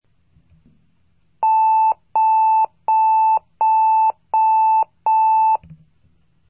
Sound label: burst